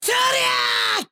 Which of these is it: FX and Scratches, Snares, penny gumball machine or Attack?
Attack